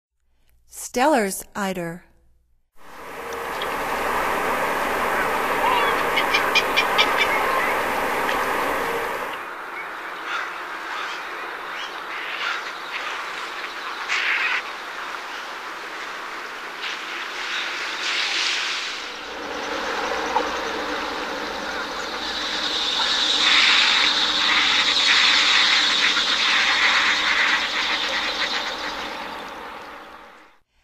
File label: Stellers Eider; North America; Stokes